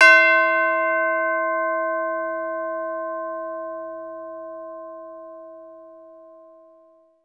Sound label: CHIME; Roland - LA Composer vol. 1